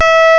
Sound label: voice; medusa; Amiga Soundtracker Sample Packs